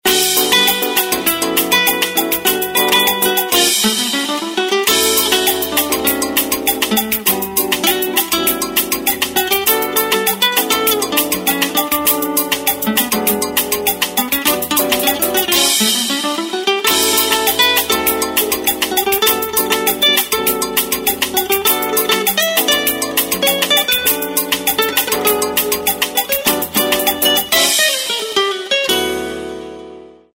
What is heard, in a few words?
Ringtone, Samsung GT-C3110; Southeast Asia